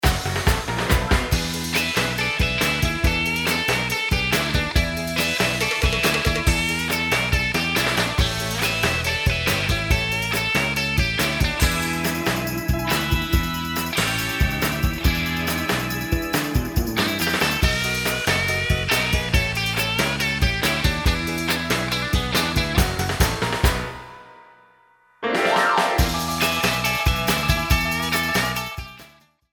surf rock; Crest

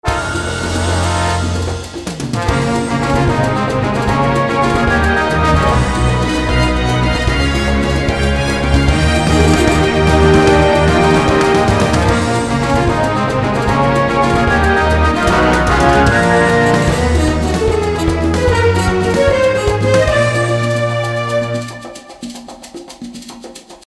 Orchestral Underscore

